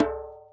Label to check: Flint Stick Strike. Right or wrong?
Wrong